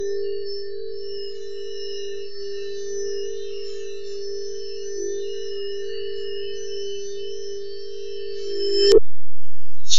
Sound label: device synced and connect; connected